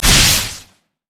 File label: lightning rush; LoL; kennen